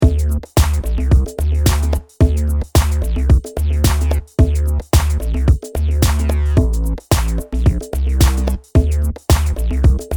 Dance drums pulled clap

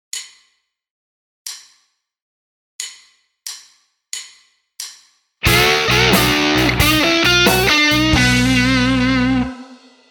guitar style

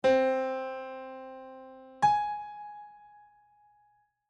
music intervals minor thirteenth

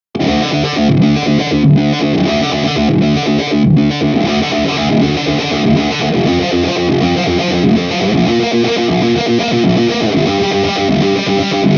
guitar sound dist

